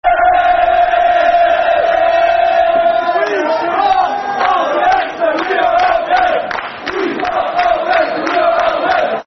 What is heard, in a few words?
Baggies, Football Chants; West Bromwich Albion Wba; fanchants